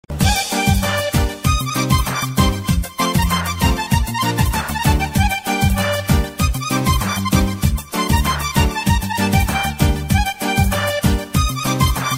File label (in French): Accordeon